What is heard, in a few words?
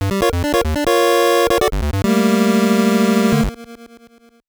gameover; panicpumpkin